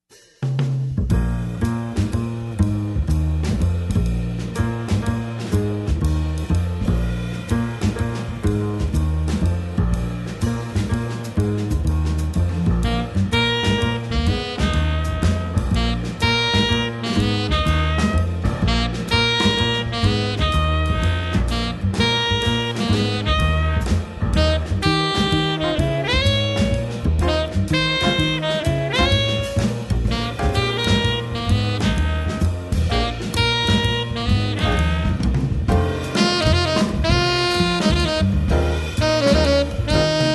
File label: Harmonique